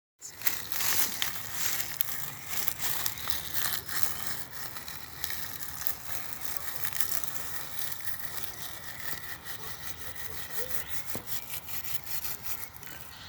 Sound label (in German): Blaettermusik